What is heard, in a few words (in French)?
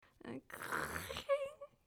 chaise métal tirée; Mobilier